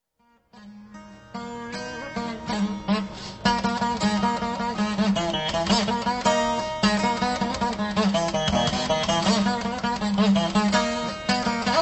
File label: Veena; World Sounds